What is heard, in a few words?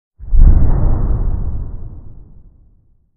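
Explo Thunderous Deep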